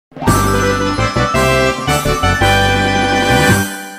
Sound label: Jackpot